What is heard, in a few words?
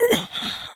grunt; mgsv